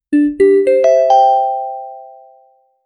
a gentle rising tone, music

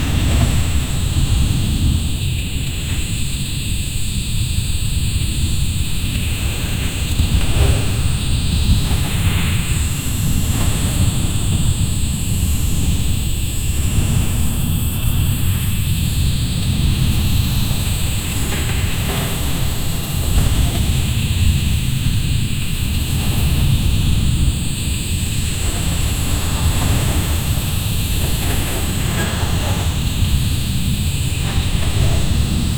dark fantasy a tent; dnd; armor

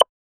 one shot game sfx, dark fantasy

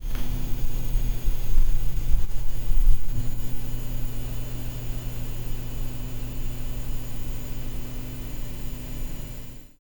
basement lamp flicker

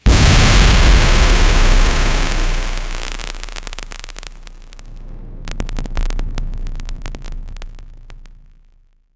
a powerful high voltage e, explosive burst

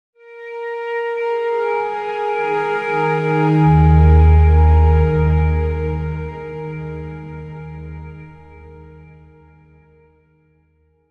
a swell of orchestral str

